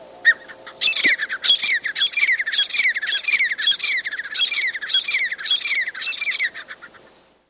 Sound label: Gray Francolin